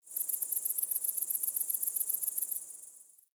Tree Crickets; Warframe; Ambience; Nineteen Ninety Nine

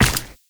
Run Ice, Footsteps; Warframe; Tenno

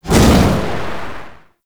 Void Dragon Firebreath Stop; Enemies; Abilities; Warframe; Duviri

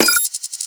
Moa Data Pad Press; Characters; Warframe; The New War; Veso

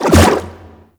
Aqua Board Spin; Warframe; Yareli

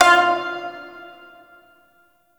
Shawzin Oct Two F; Warframe

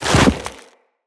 Walk Deep Snow, Footsteps; Operator

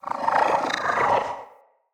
Corrupted Slug Vocal Idle

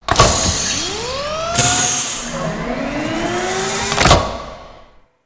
Pump Movement; Warframe; Grineer Settlement

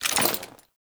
generic foley; Dagath

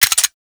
Corpus Electro Mag Mech; Warframe

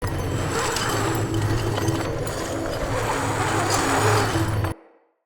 Castle Siege Zipline Loop; Encounters

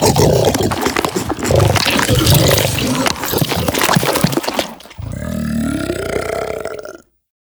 Juggernaut Truffle Eat, Infested; Warframe; Truffle Hunt

